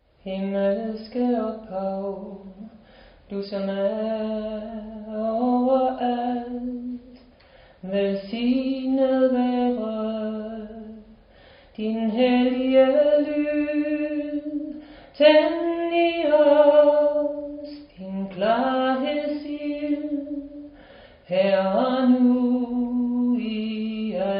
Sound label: father song